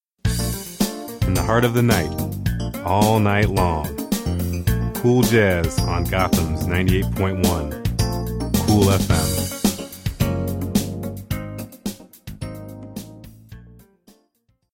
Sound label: jazz